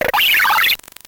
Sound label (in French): Cri